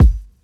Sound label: good kick one shot g key